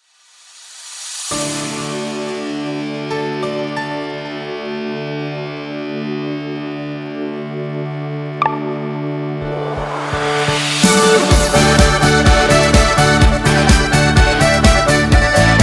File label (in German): D-Dur Karaoke ohne Chor